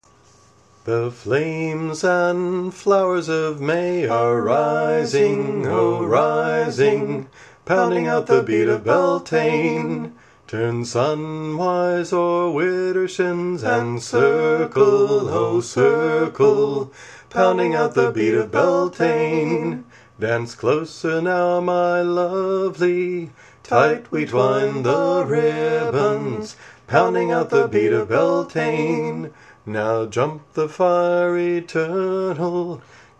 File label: Beat Of Beltane; chants